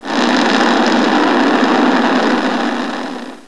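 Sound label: slow rocket, war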